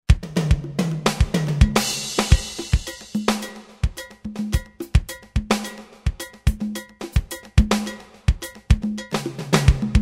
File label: latin drums